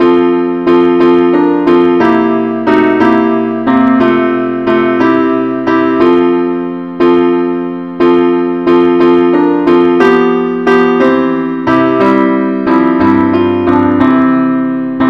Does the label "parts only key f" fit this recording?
Yes